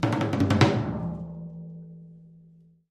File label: Drums Toms A Accent